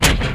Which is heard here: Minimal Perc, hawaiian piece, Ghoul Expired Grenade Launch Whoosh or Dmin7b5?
Minimal Perc